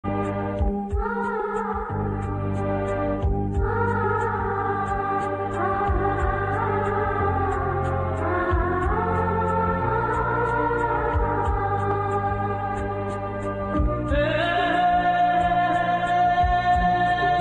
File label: kannada love bgm ringtone; om; shivarajkumar